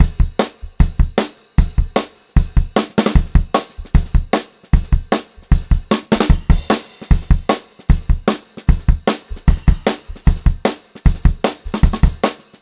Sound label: 152, Pop-Rock Drumloops; USB Soundscan vol.37; AKAI